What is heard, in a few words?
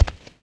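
animal large left, foot; The Elder Scrolls III - Morrowind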